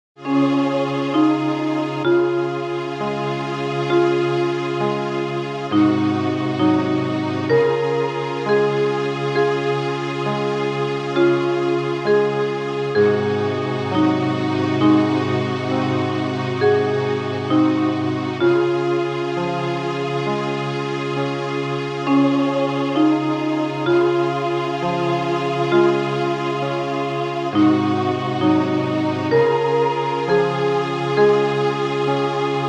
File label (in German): nachbildung glockenspiel mit orchester